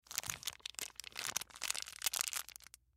Plastic Bag Screws, Plastic Bag Of Screws Handle Soft; Hardware; Foley; Blastwave